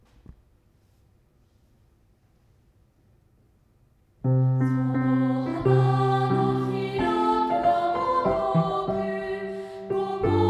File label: chorus; kouka